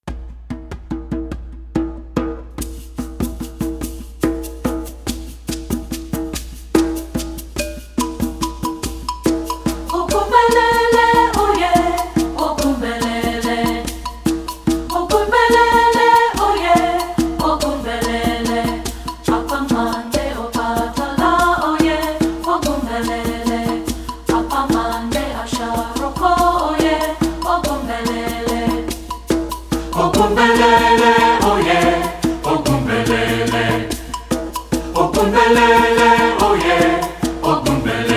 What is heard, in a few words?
SATB